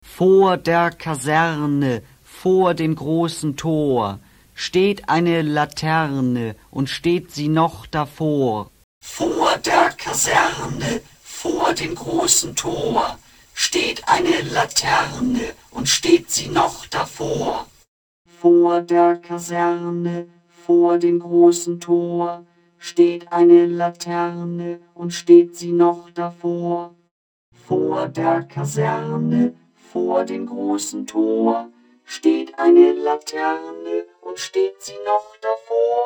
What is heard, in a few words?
vocoder